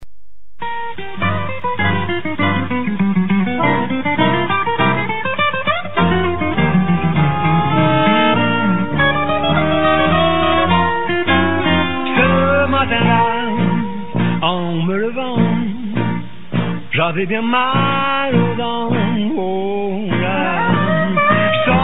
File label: swing